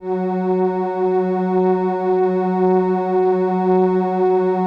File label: LAMBDA, String Machines